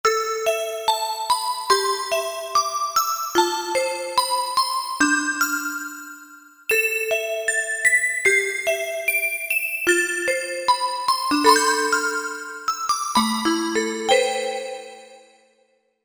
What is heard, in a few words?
Cristal, Korg MSC-1S; Korg MSC-1S Variety